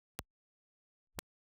pink Imp

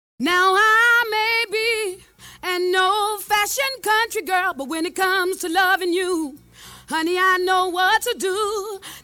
singing dry

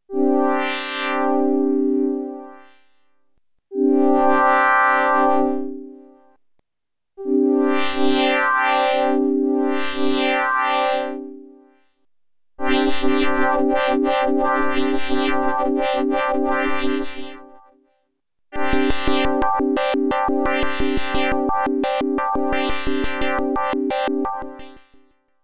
vps chord

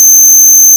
phase distortion

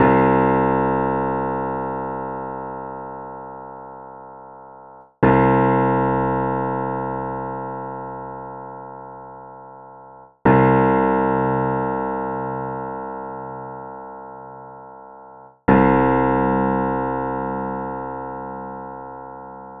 piano beating

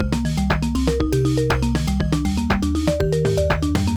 music processed

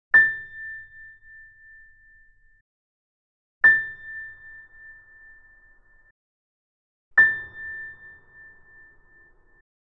Key, piano pedal

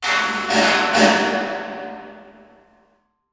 drum proposed algorithm; reverb

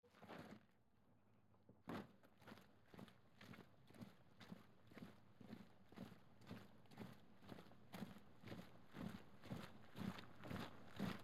march Front To Back

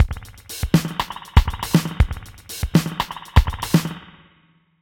drums processed modified, spring

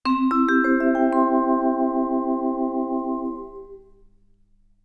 vibraphone orig

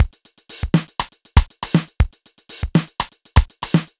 drums dry

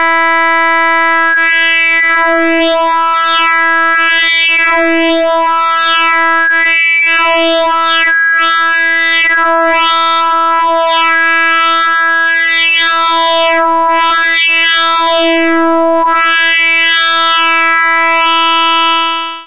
vps resonant

